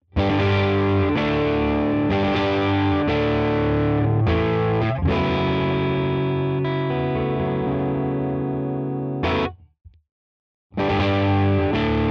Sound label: Schecter Vintage PT Fastback II B crunch chords all positions